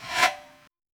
paint gun blot sound, art

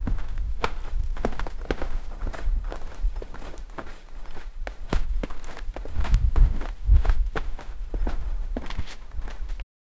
boots walking heavy and; cloth

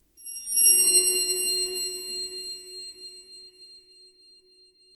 short soft divine sparkle; ethereal

